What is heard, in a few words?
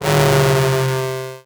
Transition Distortion Electronic; Production Element Title Transition